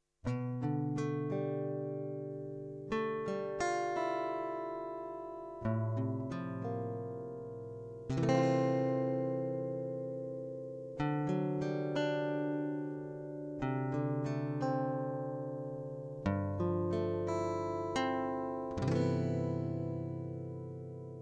guitar motif in C major

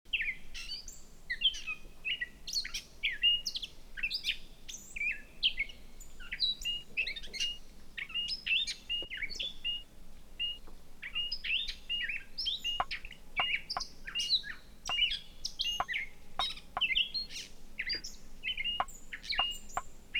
birdsongs

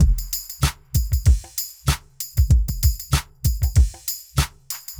beat loop boost - 2 bar 96 (dry)

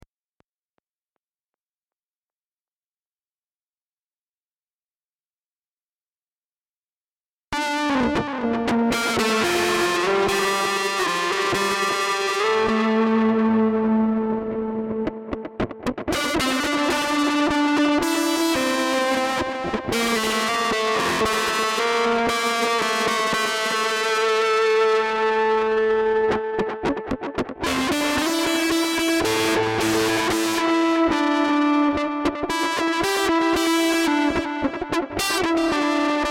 Dm112 Seven Four Groove

